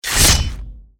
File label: GhostKnight Attack